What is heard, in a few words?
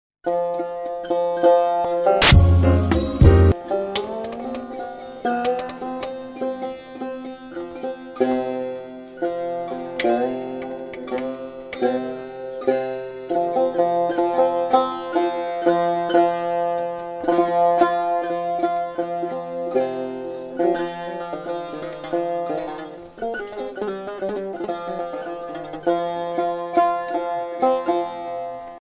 Kook-e-Tar